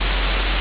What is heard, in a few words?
ambience room tone